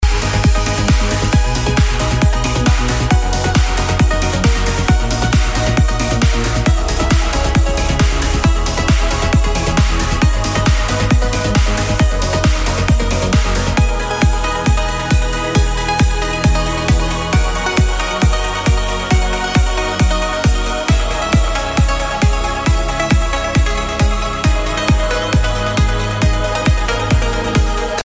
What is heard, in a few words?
anthem; asot 950